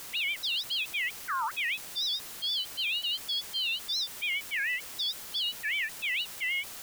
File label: simulated song, acoustics